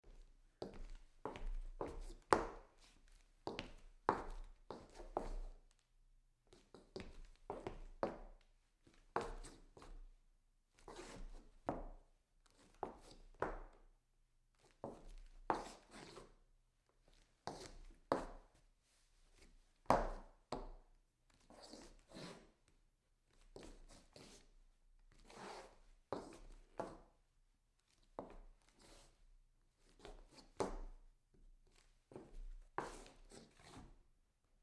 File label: scuff turnaround on wood